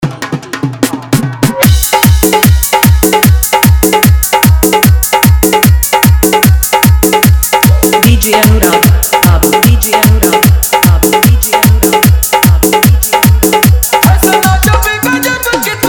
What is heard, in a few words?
Dhollki Bass Mix